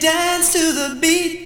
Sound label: VOX SUNG; Megabass Remix! 2